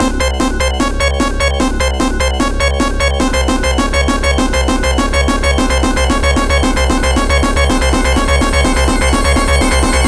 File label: Jingle - Timer; Metal Sonic Hyperdrive; genesis